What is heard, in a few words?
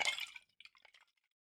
Impact Flask